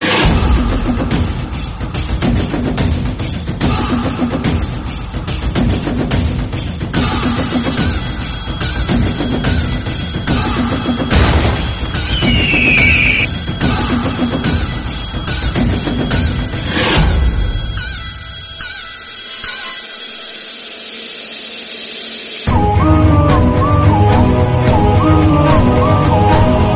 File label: BGM Instrumental; Pushpa; Allu Arjun